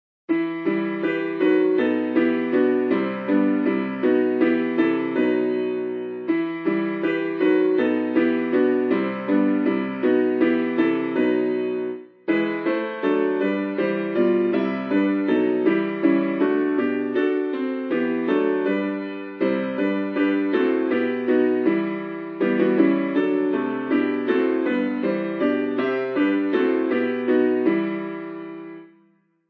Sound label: God Rest Ye Merry Gentleman Plain